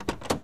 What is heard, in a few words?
68 Road Runner Door Open, Automobiles